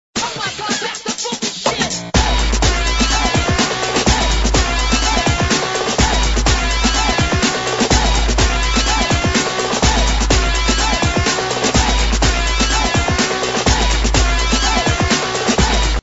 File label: Funky